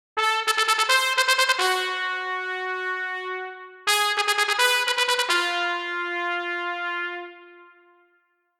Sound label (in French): Tatata forte